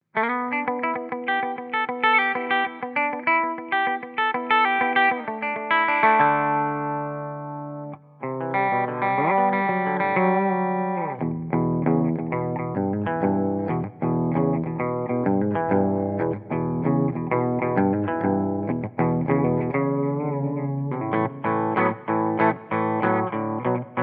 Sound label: Hyper Vintage Bridge Clean